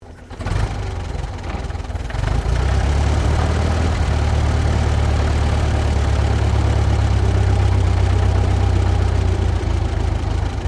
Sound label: Truck Chevrolet 1926 30cwt Start Stop; Classic comercial vehicles